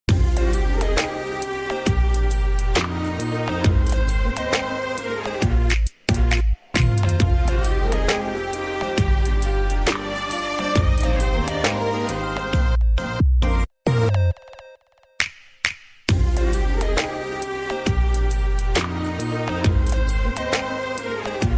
love instrumental ringtone romantic ringtone love ringtone music